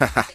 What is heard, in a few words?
ha ha, Voice ooh ahh